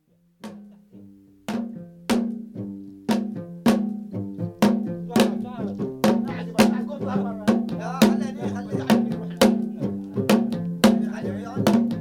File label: Tambura, Strings; Manama Bahrain; Strings Flutes Trumpets; Music In The World Of Islam